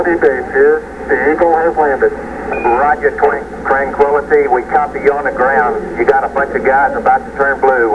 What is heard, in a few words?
tranquil